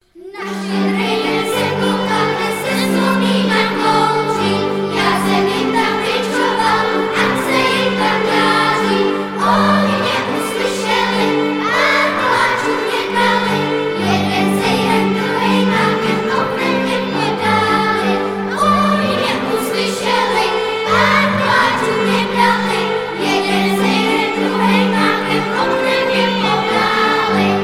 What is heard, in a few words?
czech folk song; na stedrej den